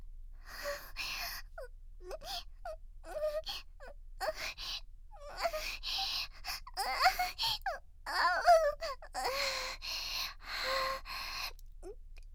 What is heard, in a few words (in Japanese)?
乳首を吸われている喘ぎ